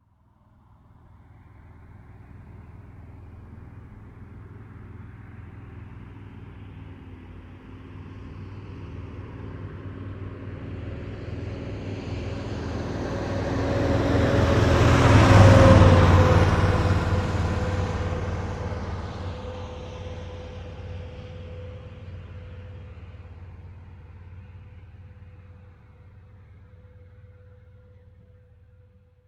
Truck Pass By Automobile; Vehicle; Trucks; Vehicles

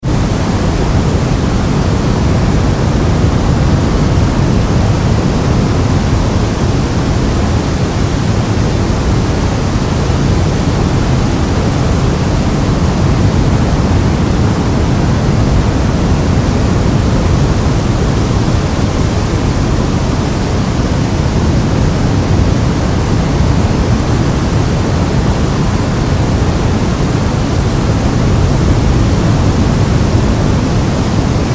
Rocket Booster Steady

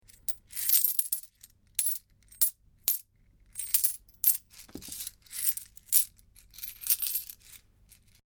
Money Coins; Money Sound Effects

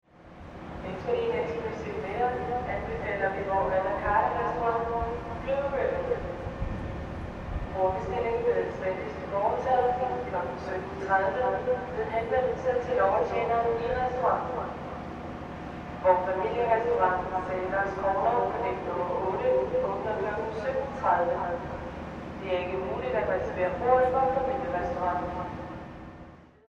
Ambience Europe Cruise Ship With Pa Announcements; Boats

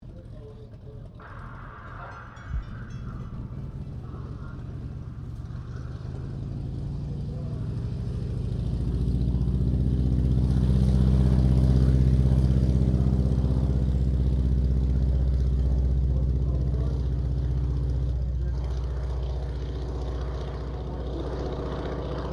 AIRCRAFT SOPWITH PUP REPLICA TAKEOFF; 1916; Airplanes; Vehicles

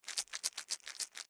Foley Medicine Bottle Shake Movement Small; Miscellaneous Household

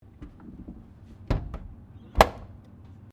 Car Holden FE Boot Close; Car Holden Fe 1957; Classic Australian cars; Cars (Sound Effects:Vehicles)

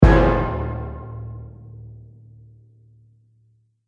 Trailer Hit The Bell Tolls

